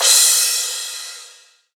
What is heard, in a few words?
Crash, Drums Cymbal